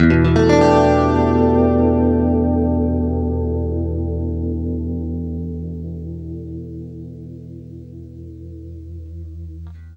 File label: CLEAN EM9, Guitar; East West; Steve Stevens Guitar Samples Collection